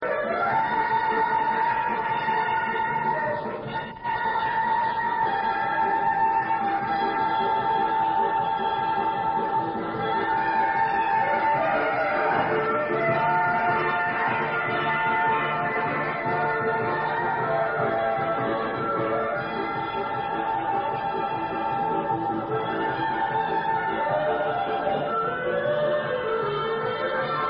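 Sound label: Instrumental, Israeli Dances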